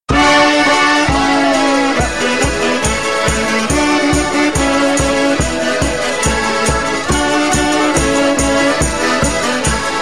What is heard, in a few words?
polka; lietuva; ringtones lituanos